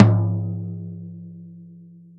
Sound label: floor